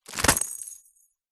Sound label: foley packet plastic cat ball bell set down hard; zapsplat